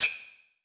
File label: Multimedia Internet CD-Rom Flash Short